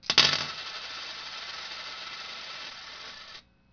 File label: coins table